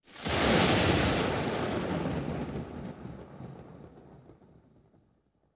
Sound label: Lightning Strike, Thunder & Lightning; Sony Pictures Sound Effects Series Volumes 1-10; Sony Creative Software